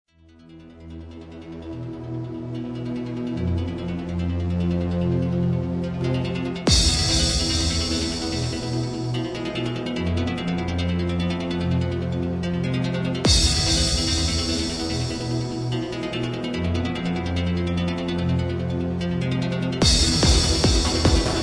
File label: indian pump